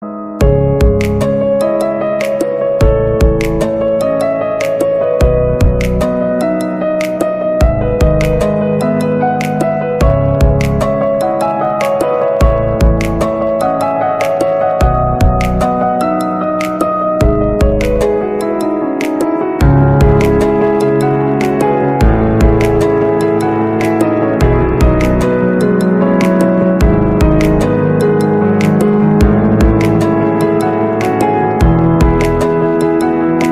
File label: Ringtone